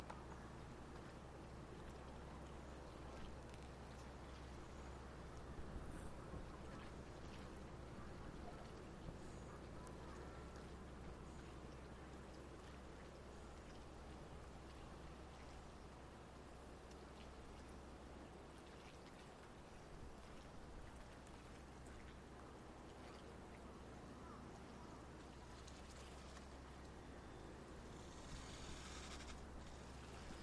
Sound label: wharf, environment